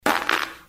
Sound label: fart short burts